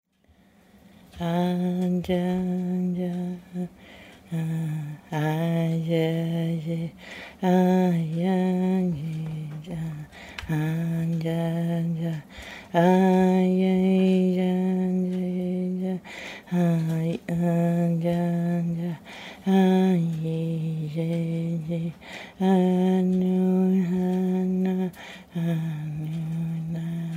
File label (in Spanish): yamana canto pasani; musica y danza